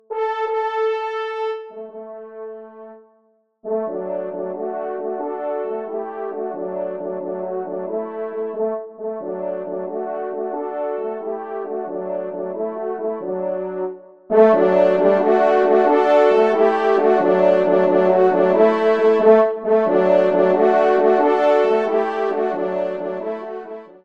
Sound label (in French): TRP; Guillaume Tell; Rossini; Pupitre 3° Cor